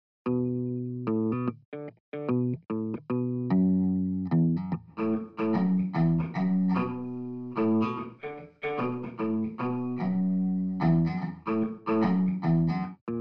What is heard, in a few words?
trash